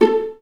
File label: VA PIZZ A2; Best Service; Peter Siedlaczek's Advanced Orchestra